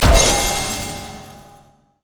vortex; LoL; diana skin02

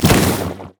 blaze; LoL; brand zombie

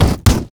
bear basicattack; LoL; annie base

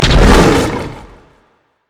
Leap; rengar 5stack

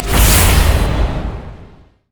spectral; LoL; yorick

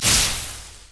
death ray; viktor

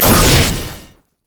w oh initial; Vel'Koz

